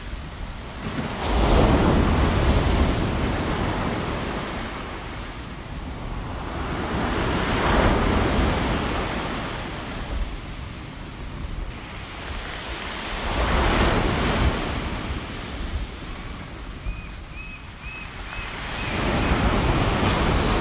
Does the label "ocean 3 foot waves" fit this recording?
Yes